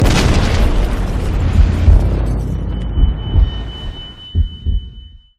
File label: impact; declare